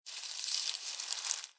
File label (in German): blatter geraschel